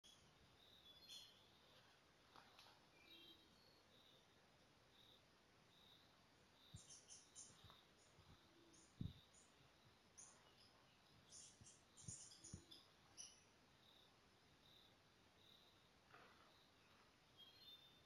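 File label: Montane foliage gleaner, bird; برگچین کوهی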